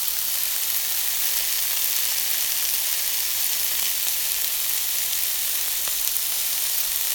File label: Melting Butter On Hot Pan